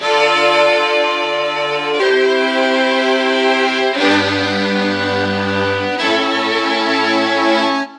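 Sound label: STR-CHORDS, string chords, Disco; Best Service ProSamples vol.48 - Disco Fever; AKAI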